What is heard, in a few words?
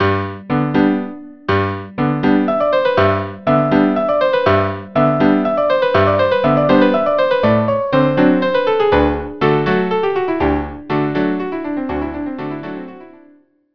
trio